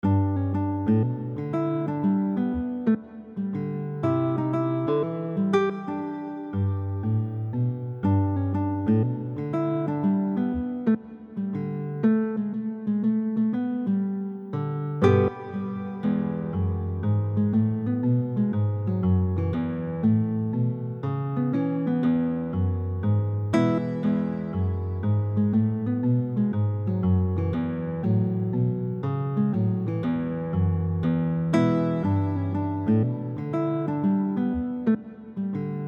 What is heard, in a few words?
walking bass